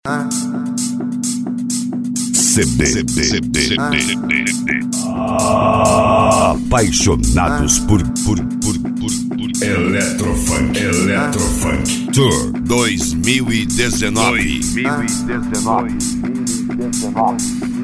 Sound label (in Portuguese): eletro funk